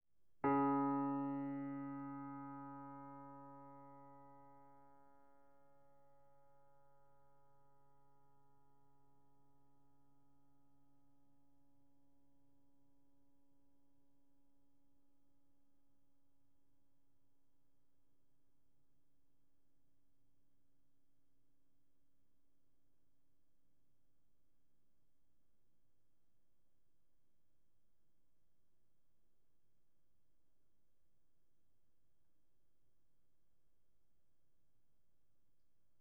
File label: Piano mf Db3